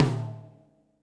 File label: tom, Drums Acoustic; USB Soundscan vol.10; AKAI; DYNAKIT 1